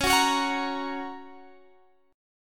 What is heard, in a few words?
Bb Minor Sharp 5th Db